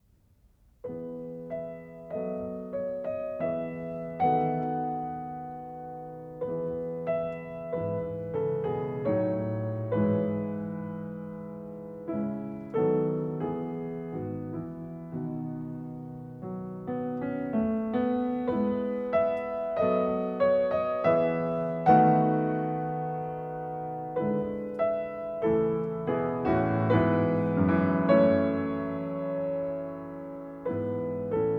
Piano Trio in A Minor, Tema con variazioni Tema (Andante con moto)